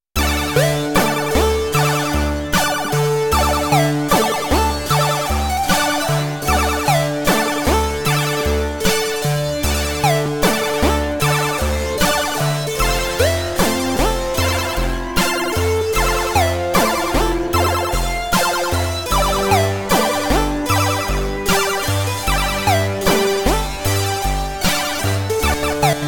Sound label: victory, music; KaijuSaveEarth; Zeronaut